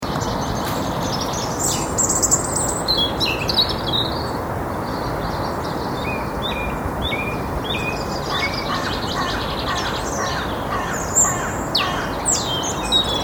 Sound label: thrush